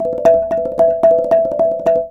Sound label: RANATTHUN; Spectrasonics - Heart of Asia CD2